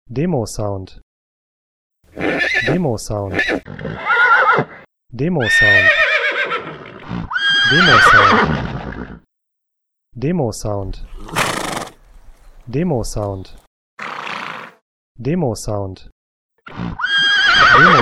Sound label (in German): Pferd